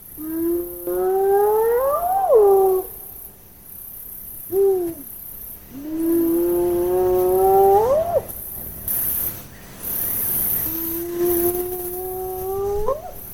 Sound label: Hyena whooping sound